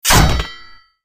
arrow hitting shield

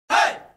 punjabi scream

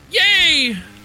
yay

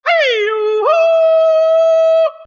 mighty eagle battle cry

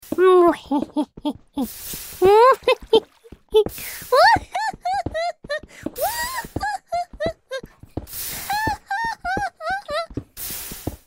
muehehe

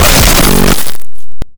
kill sound

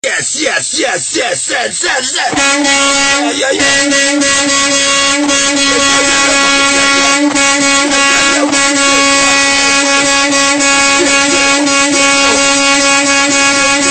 truck

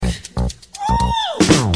uuu

